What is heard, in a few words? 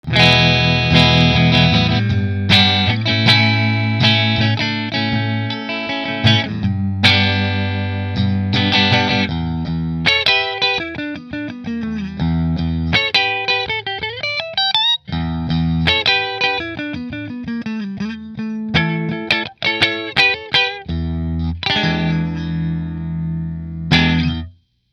Rahan koa middle fender, guitars